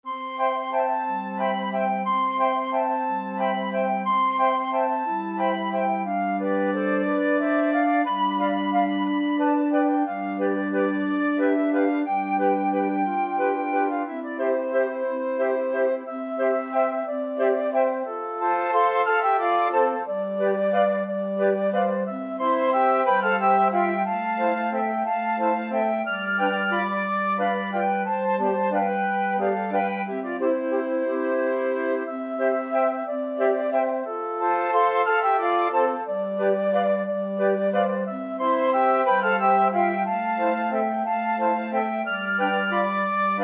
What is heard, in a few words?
cielito lindo, flute choir